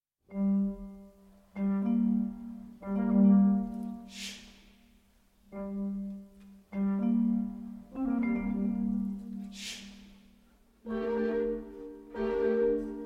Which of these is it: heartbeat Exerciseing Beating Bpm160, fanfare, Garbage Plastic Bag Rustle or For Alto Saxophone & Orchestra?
For Alto Saxophone & Orchestra